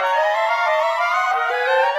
Winds, Orchestral; Sonic Foundry (Sony Creative Software); Orchestral VOL-2; Modern 16

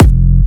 Jump Kicks, Bassdrums

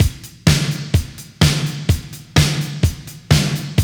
Loop, Roland; Sound & Vision - Gigapack I

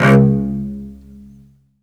SOLO CEL, Strings; Synclavier; ILIO

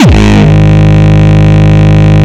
Longkick, kicks

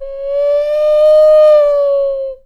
bird call

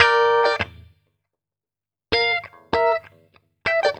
GTR; USB Soundscan vol.41 - Roots Funk; AKAI

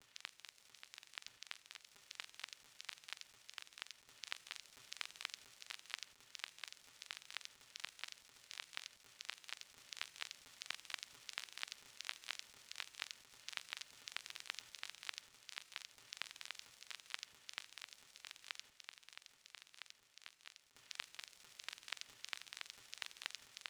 vinyl noise; Big Fish Audio; Legendary; Happiness

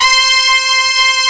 brass C5

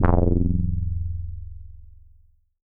BASS SH09 F0, Synths, Roland SH-09, Bass

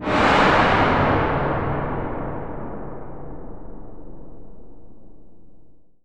AIRBORNE GO; ENVIRN-AMB

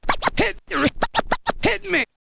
scratch kit, vinyl

